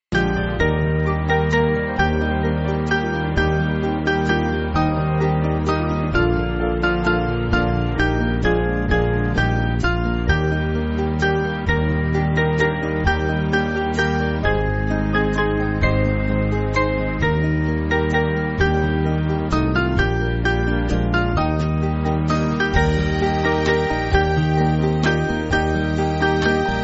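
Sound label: Band, Free Music Backing Files for Churches; My Father Is Rich; Binghamton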